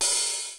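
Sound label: DDD5 open hi hat, drum; Crypton - DrumBase5000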